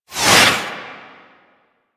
Tank Missile Barrage Whizzby; Warframe; Lasrian; Abilities

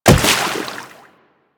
Wall Jump Water

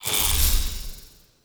Heal; Powers; Banneret

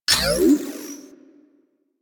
Small Power Up; DE; Warframe

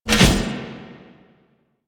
Impale Cast; Warframe; Warframes; Hoplite

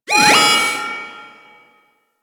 Security Camera Alarm; Objects

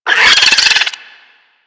Infested Biped Attack; Warframe; Moa

